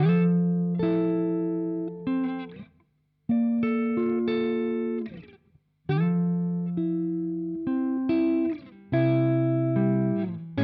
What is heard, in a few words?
Germanium Fuzz Single Coil Mid Gain; Benson